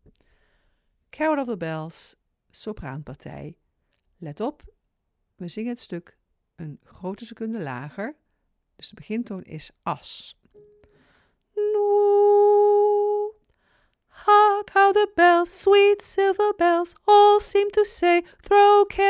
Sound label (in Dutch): sopraan; Carol of the bells